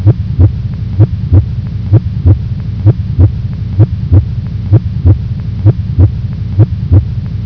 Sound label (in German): herzschlag; geraeusche menschl